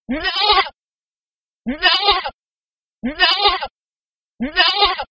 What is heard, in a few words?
Billy Goat